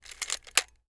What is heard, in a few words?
camera winding lever; mechanical